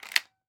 Weapon Gun Shotgun 12 Gauge Benelli Automatic Ammo Load; AdobeCC